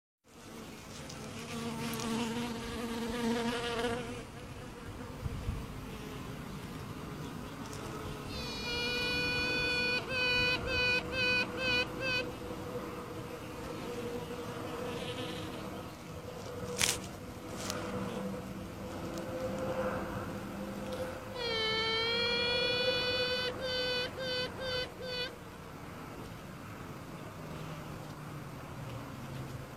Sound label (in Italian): canto della regina, l'ape regina